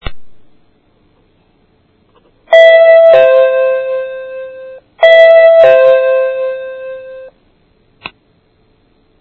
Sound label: chime